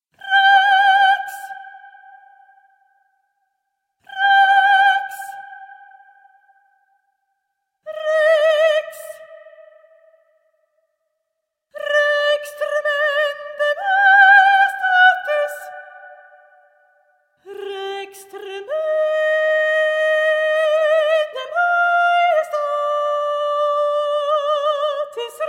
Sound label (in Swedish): sopran; Rex tremendae